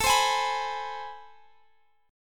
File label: A Minor Major 9th, down